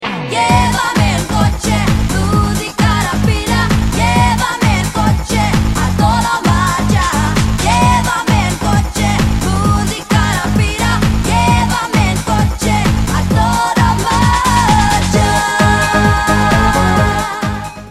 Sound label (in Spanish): marcha